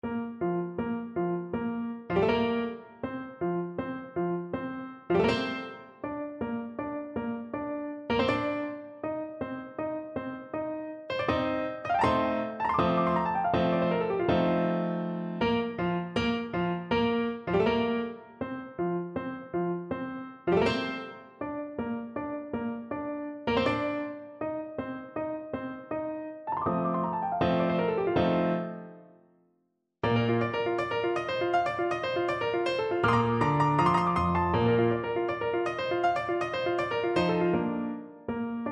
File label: French Horn